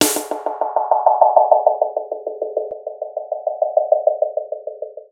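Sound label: snare processed, Disco House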